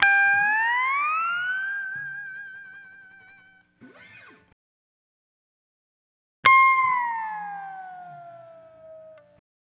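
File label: Steel Guitar C Dry, Lounge; Zero-G Kocktail Kollection; Construction Kitz (Lounge); Volcano at Sunrise 074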